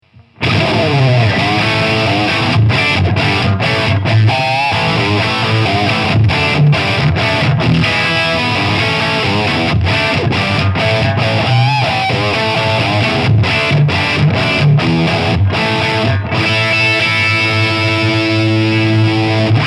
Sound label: Humbucker Bridge; Carl Martin Panama